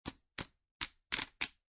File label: Poker Chips Stacking